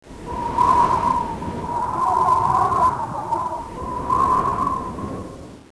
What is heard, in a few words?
high wind; ambience; aomdc